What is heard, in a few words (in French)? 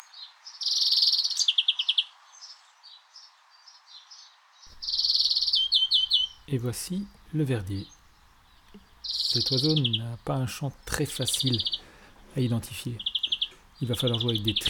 verdier audio commente